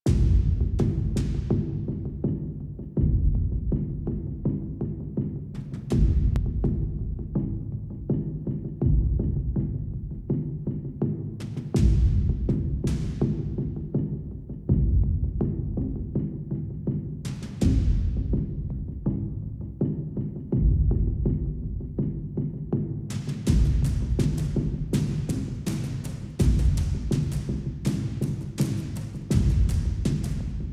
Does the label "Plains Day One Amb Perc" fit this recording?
Yes